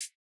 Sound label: Modern hihat, Electro